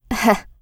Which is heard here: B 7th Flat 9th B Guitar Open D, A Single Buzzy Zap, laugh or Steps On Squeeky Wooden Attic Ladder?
laugh